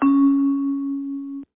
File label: key